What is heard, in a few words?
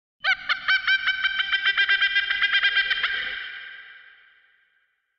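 quetzalcoatlus; letayuschih dinozavrov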